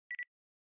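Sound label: Portable MiniDisc Beep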